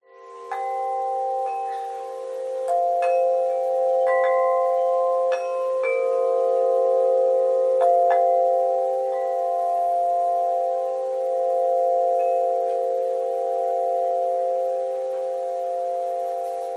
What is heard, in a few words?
windchimes; scott lawlor